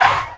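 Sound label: touch down; Falcon 40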